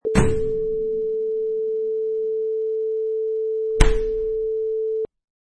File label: Refrigerator Open and Close